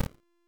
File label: Whip Whoosh; Warframe; Warframes; Khora